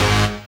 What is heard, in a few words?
SYN, DANCE SYNTH